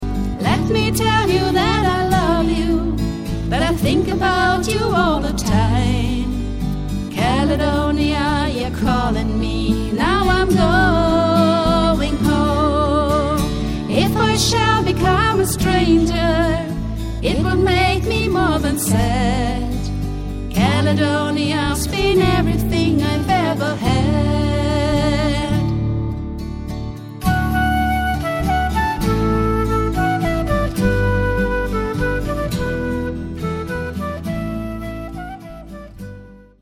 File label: Caledonia